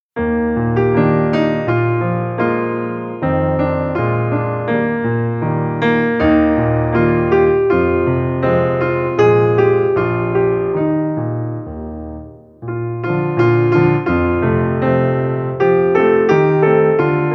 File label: solo piano